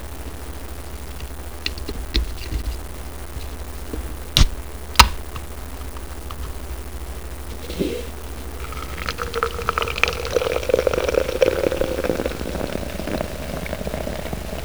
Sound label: POP CAN